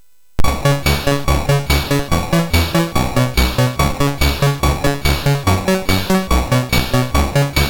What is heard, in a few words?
Synth Sample, MOS6581R3; hvsc